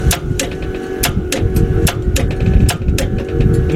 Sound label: starter drive whine